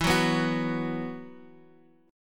E Suspended 2nd, Guitar Half Step Down

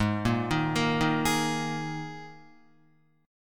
Ab Suspended 2nd Guitar; arpeggiated; JGuitar

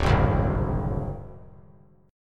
D 7th Flat 5th D down, chord; D7b5 strummed; JGuitar

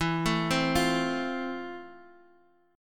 F Major, guitar chord

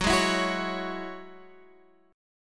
Gb 7th Suspended 2nd, Guitar, Standard; strummed

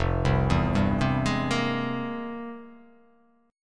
F-Minor Major 11th, down; FmM11 arpeggiated; JGuitar

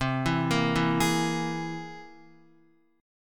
C Augmented 7th, Guitar; arpeggiated; JGuitar

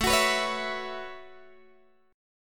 A 6th Add 9th, Guitar, Open G

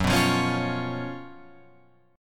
Gb Major 7th Suspended 4th Gb Guitar Open F

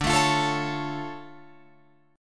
D Minor Major 7th, D, Guitar, Open F; DmM7; strummed

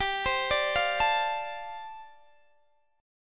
G 7th Suspended 2nd Suspended 4th, Guitar DADGAD

